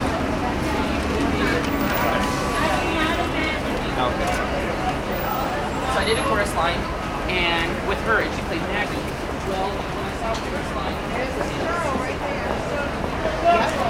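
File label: ambience urban city nyc times square; sound effects one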